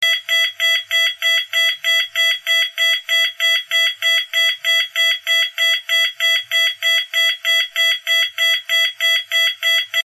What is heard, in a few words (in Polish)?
ton